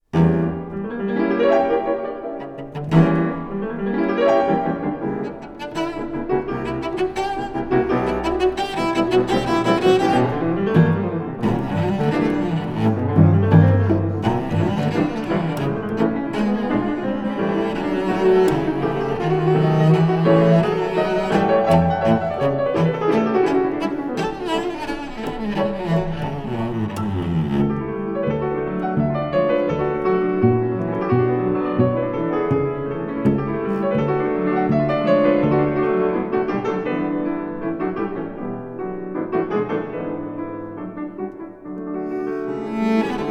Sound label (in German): Sonate für Violoncello und Klavier D Dur Molto allegro e viv; Felix Mendelssohn; op.58; Molto allegro e vivace